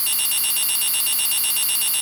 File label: Ring